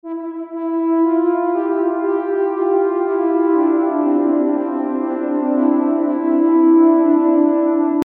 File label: echoey flute ode to joy